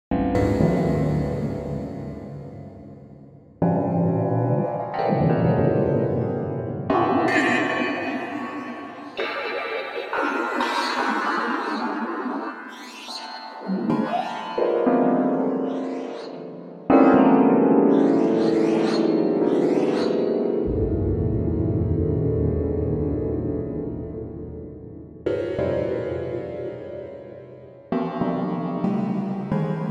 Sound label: Padshop 2